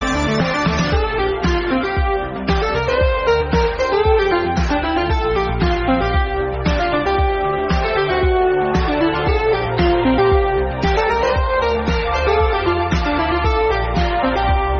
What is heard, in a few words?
Synth